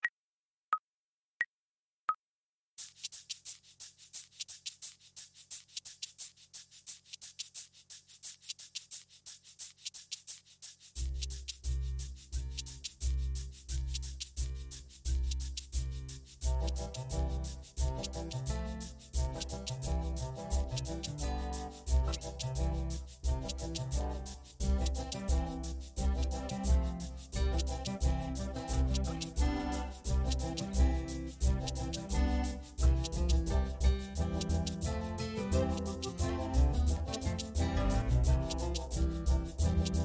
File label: cotton eyed joe piano